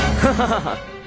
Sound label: you huhaha